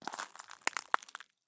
walk glass; Escape from Tarkov

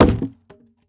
wood; Rust

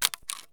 mr133 shell out mag; Escape from Tarkov

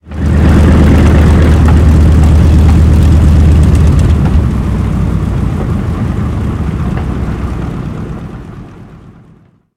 engine b25 stop; War Thunder

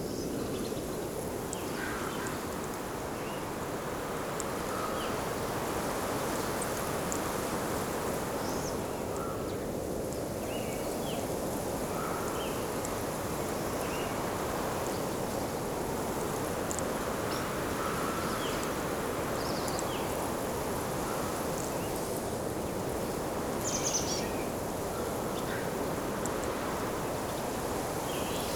ambience coniferous forest; Rust

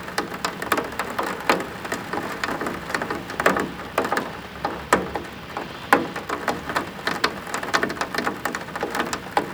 rain car lite

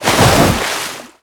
zodiac splash small; veh zodiac splash small; Modern Warfare

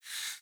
door large wood lock movement start; Rust

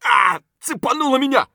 hurt light; Escape from Tarkov; scav5

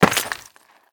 foot glass dirt, footsteps; Call of Duty Modern Warfare

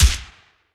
apache 30mm gun outside close shot; guns; tanks; War Thunder

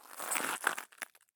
turn glass; Escape from Tarkov

